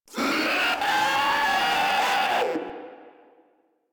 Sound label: Proto Infested vocal attack